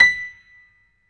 PIA GRDPI, Roland; Sound & Vision; Gigapack I CD 2; PIA Grand 5

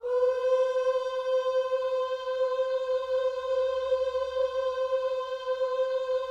OOS C 5E, gospel; Spectrasonics; Vocal Planet

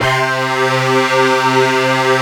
SYN, 160 BPM, Drum & Bass; Ueberschall; Drum & Bass Flash Trackz

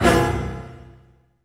HIT, Orchestral; Orchestral Loops; 128 STRAVNSKY

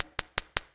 hihat; 159 Fmaj; Big Fish Audio; Hardcore Breakbeat Action; Math

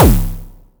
Kick; ModelCycles

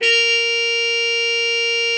A#4, TRUMPET; Best Service ProSamples vol.42 - Session Instruments; PS-42 Session Instruments

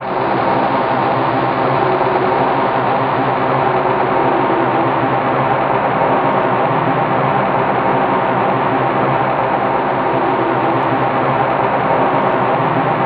ATMO, Special FX Twisted; USB Soundscan; AKAI

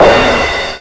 CRASH, Drums Dance & Groove; USB Soundscan vol.11; AKAI

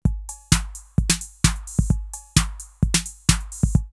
808 Chugging Along 130, drumloops, DrumMachines; X-Static Goldmine

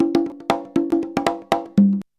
CONGA 119; USB Soundscan vol.56 - Modern Percussion Loops; AKAI

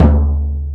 Nice Deep Drum; EMU Xtreme-Lead-1

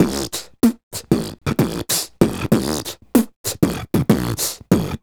Beat Box, Hip-Hop and RnB Vocals

